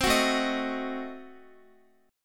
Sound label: A Diminished 7th A down Guitar; strummed; tuning=4E,4A,5D,5G,5C,6F